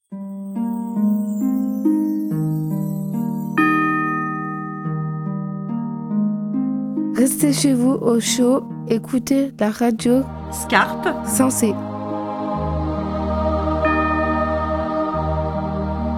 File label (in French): JINGLE; Au chaud